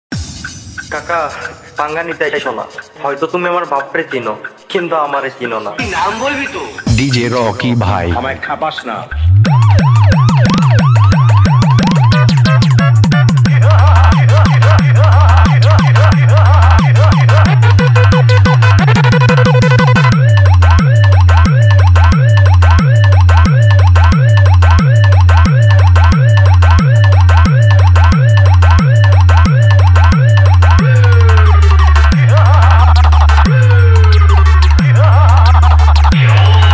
Tending Raning Humbing Bass Ambulance Hron Animal Sound